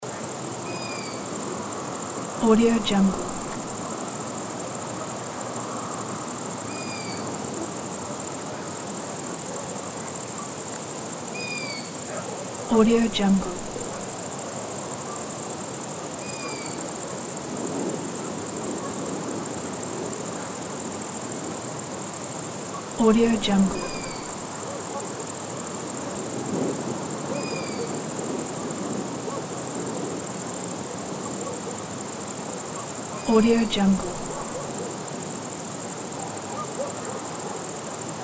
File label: cicada